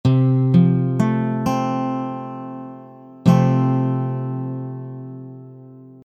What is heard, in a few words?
F chord basic, guitar